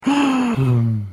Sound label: Kingpig Snooze; bad piggies; android; mobile; windows